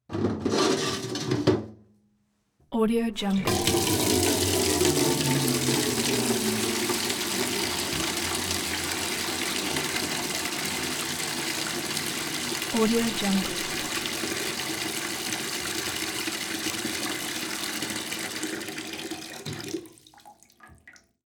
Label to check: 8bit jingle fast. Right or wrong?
Wrong